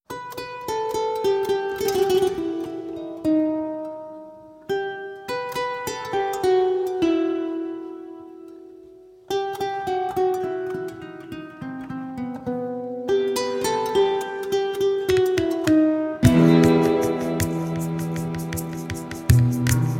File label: Instrumental Version